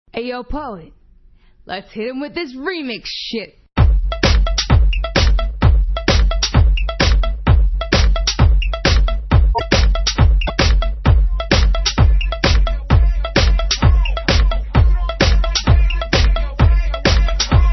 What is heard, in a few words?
dance radio remix